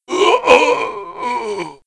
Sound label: Death; Mafia